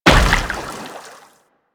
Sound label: Step Water, Footsteps; Warframe